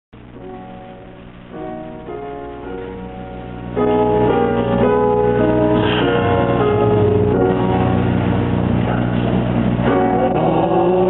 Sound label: Hymn